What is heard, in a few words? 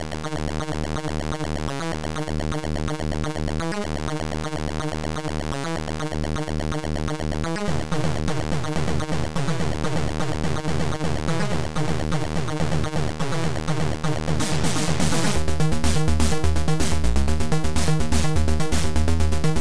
TechnoMx